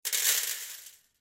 Beads Pour Out, Beads Pour Out Metal Tray; Art Craft; Pouring

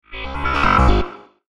Martian Artifact, alien sound effect; science fiction sound effect; Andrew Fleming

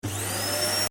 Vacuum on, Vacuum (Domestic): Switched On; Appliance Sound Effects; Appliances (Sound Effects:Household); Stuart Duffield

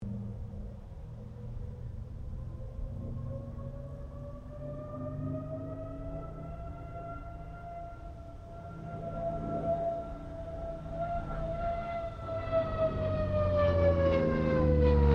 AIRCRAFT CA-13 BOOMERANG PASS; Airplanes; Vehicles

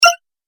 Multimedia Button

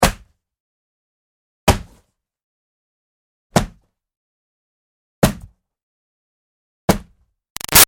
Hockey Hits Body Check Tight; Sports; Big Room Sound

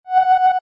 Multimedia Alarm Digital Beep; Scifi Production Elements, Set 2; Science Fiction Sound Effects

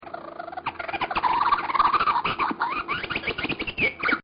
Crazy Bird; Birds; Animals

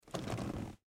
Chair Leather Sit Down Couch Foley; Office; Blastwave

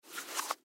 Shoe High Heel, Shoe High Heel Take Off; Shoes; Objects; Blastwave; Foley

